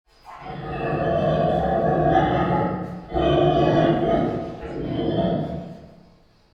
pig grunting grumbling; ambience; dfcaverns

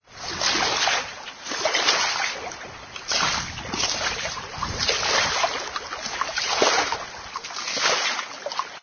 water swimming splashing; ambience

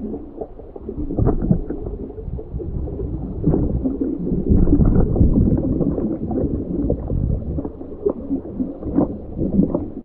scuba tubulent bubbles; server-nalc; ambience modpack

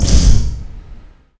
player join; minetestforfun game

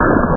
jammer; MoreMesecons

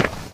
metal footstep; nalc game

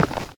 hard footstep; minetestforfun game

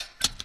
gate open close; homedecor modpack

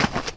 sand footstep; minetestforfun game

